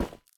Footstep Solar Panel

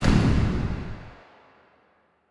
Big Lift Activate

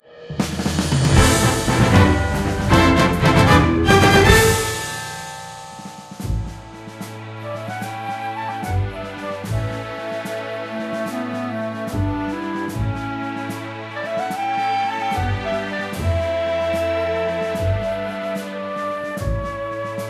Victory Jingle 231 12-8; HuntersGuild

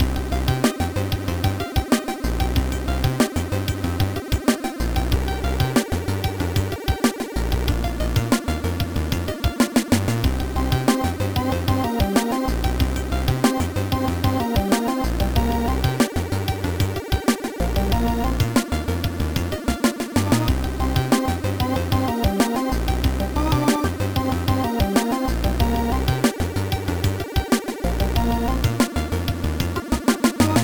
Intro music; super obliteration; amiga

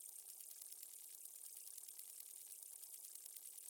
Wires On Loop Trickle Rev

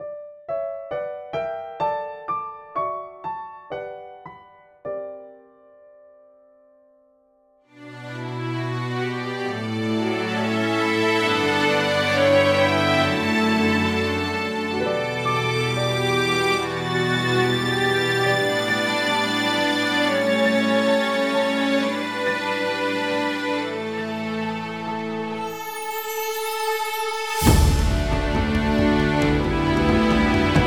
Ending; Champion Jockey G1 Jockey Gallop Racer; Wii

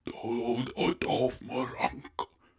Waterway; Gs Waterway 07

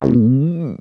eating sick; MiniGames

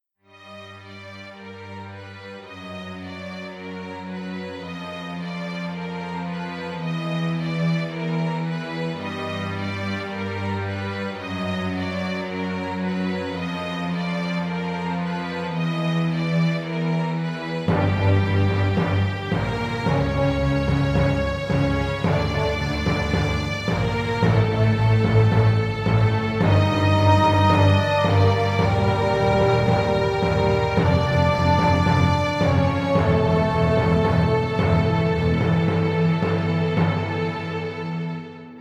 Tension; arthurs quest battle for the kingdom

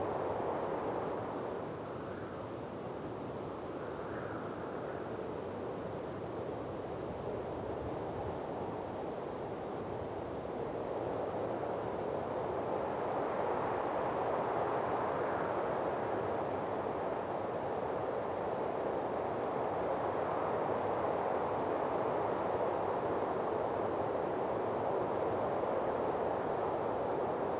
Ambient, Islands; rayman raving rabbids playstation 2; Prototype Ambient

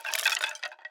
Skeleton Idle

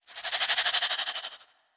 Malediction Insect

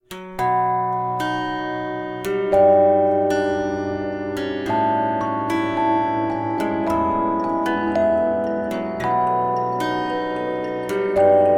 campfire; curious expedition 2 macos ps4 switch windows xbox one xbox series xs gamerip 2021

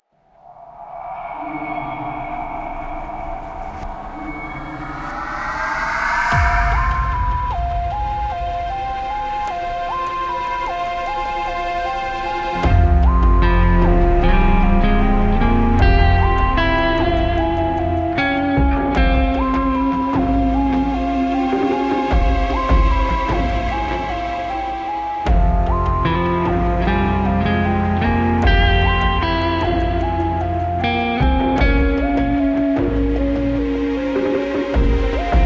Battle 76 4-4

